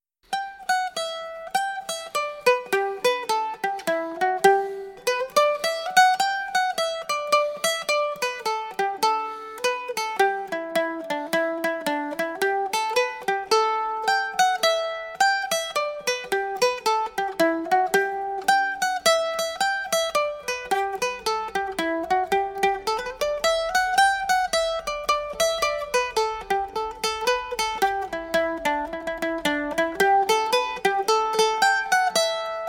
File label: banjo